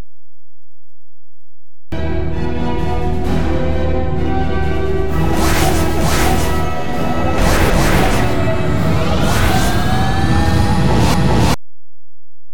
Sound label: gun raw; stargate; asuran